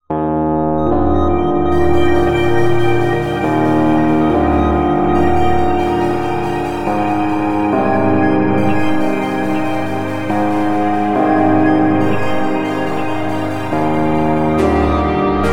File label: Spooky Chill Repitched; Game Music